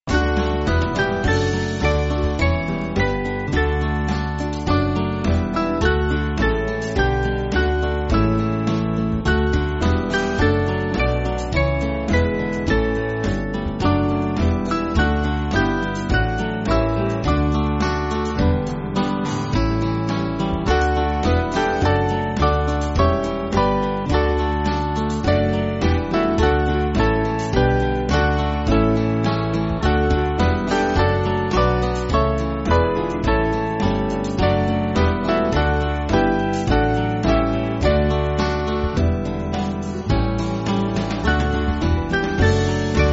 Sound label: Band